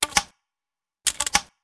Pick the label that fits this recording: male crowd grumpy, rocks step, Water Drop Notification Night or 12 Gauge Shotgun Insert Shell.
12 Gauge Shotgun Insert Shell